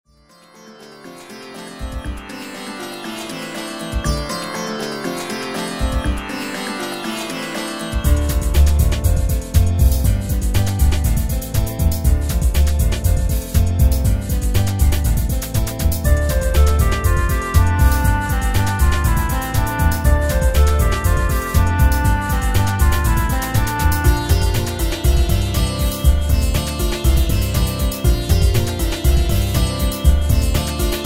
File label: orient sitar